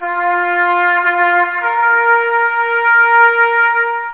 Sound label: taps